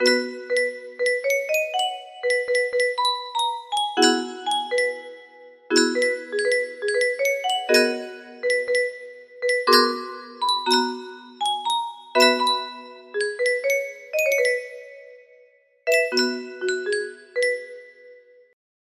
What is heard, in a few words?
music box